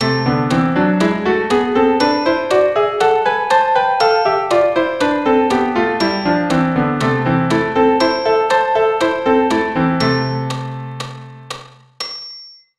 Am harmonic

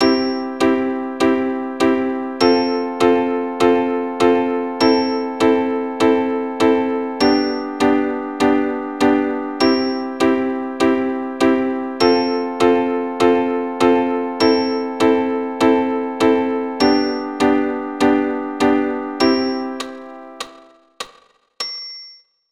C F Am G, keyboard

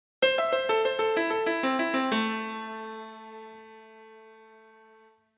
Am arp, keyboard

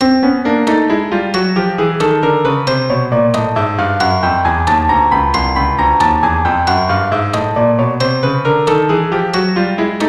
Chrom

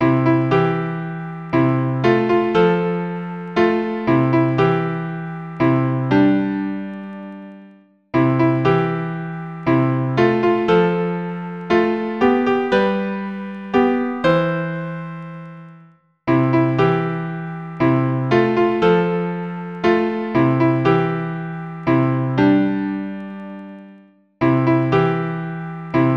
keyboard

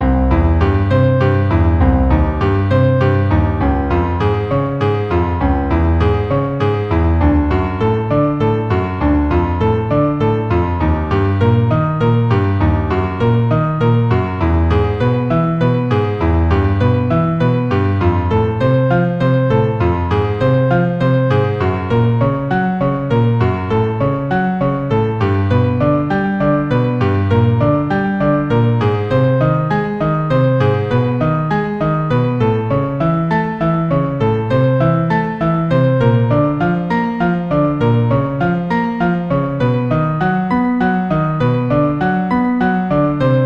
Arp Ex 1 100, keyboard

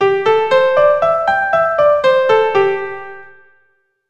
C Major Pent Mode

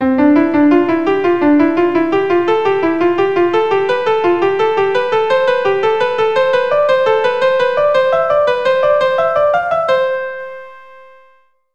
Scale, keyboard

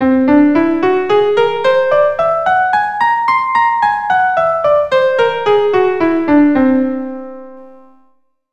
C whole tone scale, keyboard

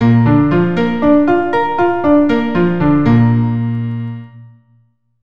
B flat Arp 100, keyboard

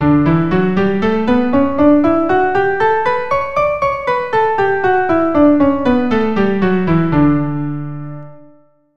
D Major, keyboard

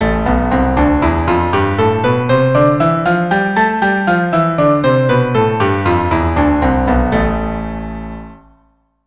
C Major on A 2 octaves apart, keyboard

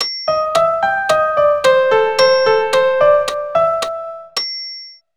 Eighths

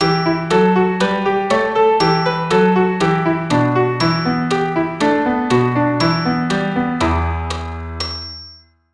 Em melodic

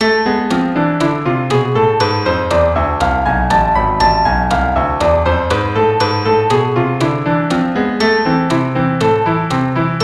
Am harmonic

